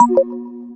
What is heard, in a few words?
manner mode; Samsung SGH-A257